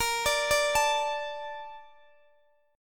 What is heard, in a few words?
A sharp Major 7th, Guitar Open D